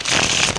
Riffle, iPoker; iPoker 2000

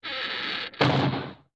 creek; The Interactive Alphabet

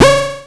Zither; Mac Expert - Winter 95; WatchWork 1.0

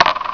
Launch Hit; QuadMire 1.0; Quagmire

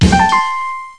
UP LEVEL; Tetris; NETRISFX

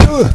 Hit Ground; Dark; Clash

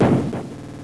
ball back; Ancient Asian Sex Secrets

